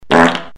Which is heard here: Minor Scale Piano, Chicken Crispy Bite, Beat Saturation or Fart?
Fart